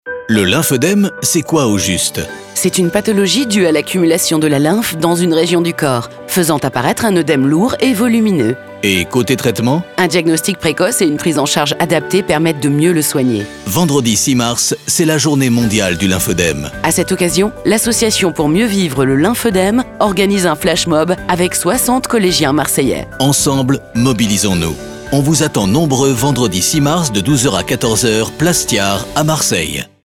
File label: jingle; PACA 2020